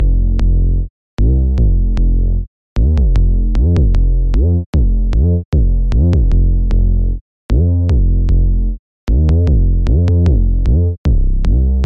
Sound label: 808 bass line, 76 bpm